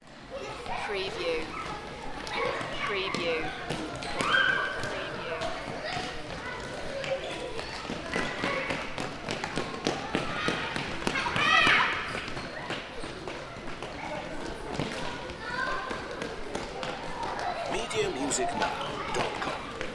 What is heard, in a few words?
Crowd, Children Indoor Sports Hall